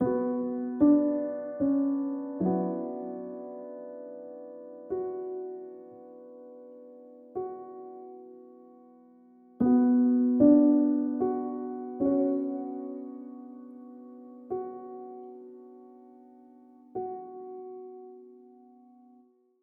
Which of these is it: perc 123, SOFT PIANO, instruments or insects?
SOFT PIANO